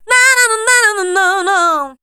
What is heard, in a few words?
RHY LINE, Voice; Best Service; Voice Spectral II